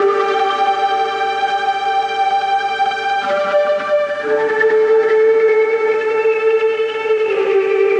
PAD; GrooveMaker demo; Serious Software; Macworld 1999-04

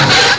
scrape

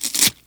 guard; kult

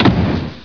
Close Door; 250 Desktop Themes

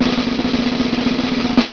tambour; Dicodin

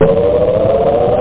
get powerup; WormWars

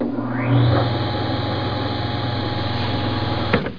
Panel Open

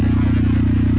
strange loop; CyberNation; Arcade

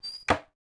chest close; poolofradiance

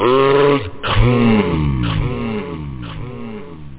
HARDCORE; Workbench Add-On - Volume 1

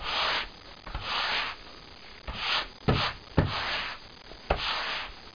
sweeping

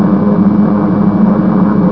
eng; BOMBER III™ (DEMO)

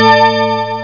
Bonus Up; Chrysanthemum 1.01

